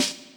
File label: normal hit whistle; osu!